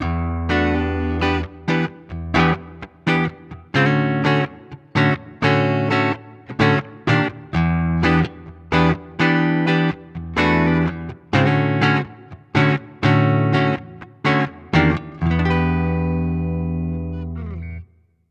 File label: X700 Neck Humbucker Clean Split; Cort